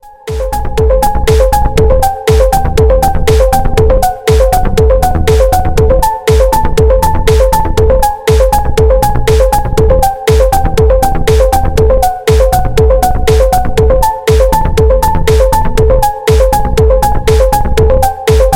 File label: Active Beat; MIUI14 and HyperOS 1.0